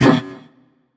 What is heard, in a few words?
grunt attack; smite; ares